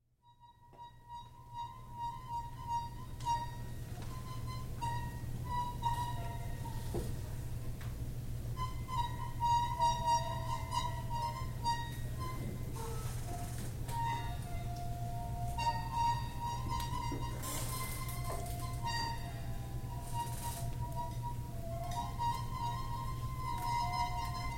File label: Drum Thunder, live